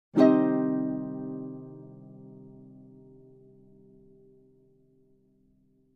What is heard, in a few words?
Full harp minor chord strum